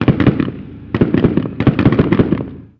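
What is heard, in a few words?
gun assault medium; Call of Duty Modern Warfare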